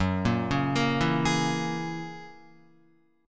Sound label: F sharp 9th F sharp Guitar; Listen to F#9 arpeggiated